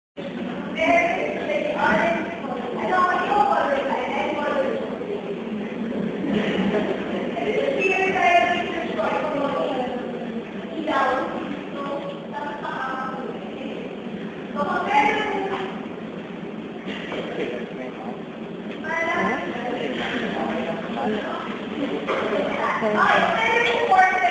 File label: dorm classroom noise w teacher